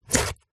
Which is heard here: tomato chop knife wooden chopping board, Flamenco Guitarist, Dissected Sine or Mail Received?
tomato chop knife wooden chopping board